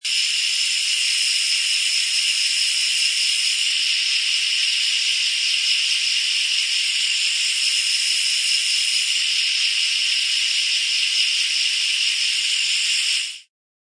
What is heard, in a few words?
Gas leaks from a stovetop; Leaking Gas; Hisses; Objects